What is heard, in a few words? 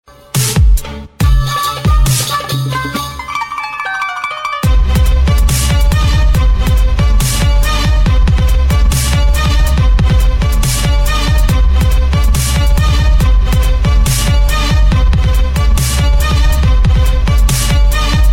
Hip Hop Beat